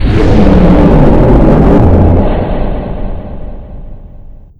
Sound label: Summon; Stormbreather